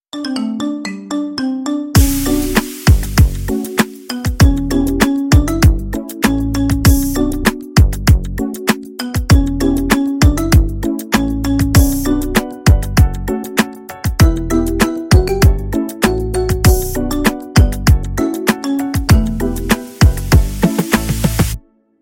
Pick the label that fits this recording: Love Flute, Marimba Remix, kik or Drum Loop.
Marimba Remix